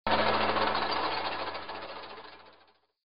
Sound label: platform moving